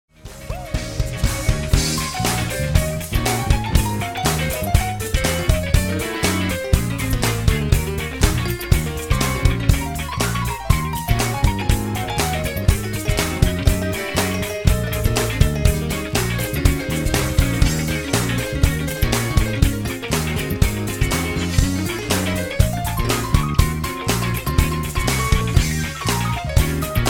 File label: Solo Live